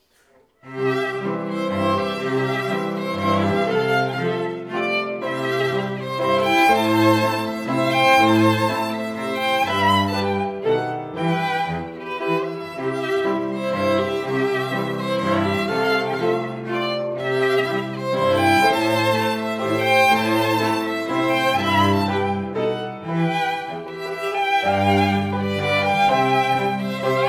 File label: Brahms Waltz quartet